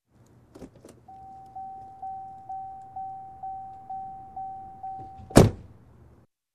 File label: Getting in Car